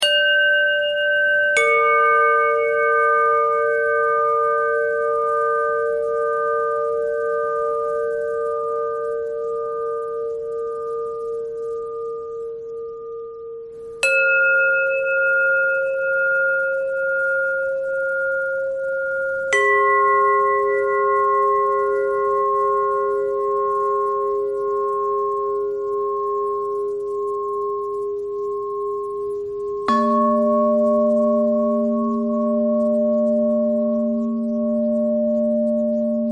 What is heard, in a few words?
bell thread bend thread